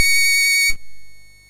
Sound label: sid MOS6581R2, GAMES; Face Off